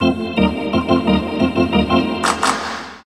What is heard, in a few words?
Organ; NHL FaceOff 98; PS1